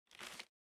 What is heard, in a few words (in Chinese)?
纸条包裹钥匙